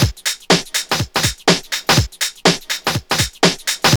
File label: house loop